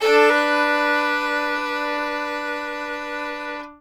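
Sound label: fiddle hammer G4; Sampleheads; Whole Lotta Country 1 & 2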